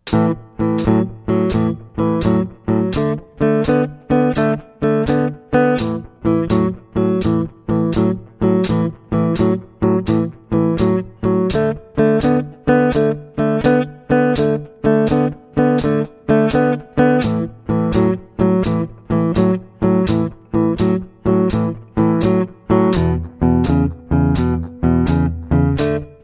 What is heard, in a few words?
12 Bar Blues, jazz guitar